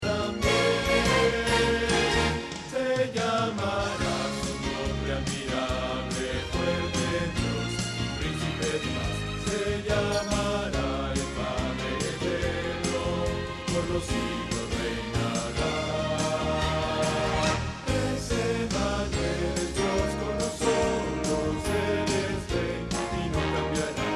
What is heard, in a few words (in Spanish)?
Bajo